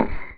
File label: Squelch